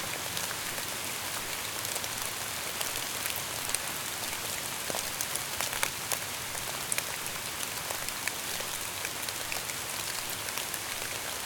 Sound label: ambience rain; silverback